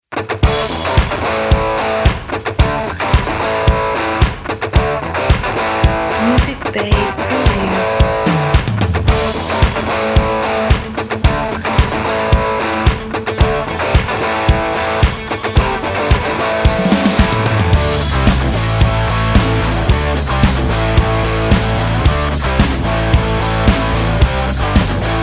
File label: Uplifting Hard Rock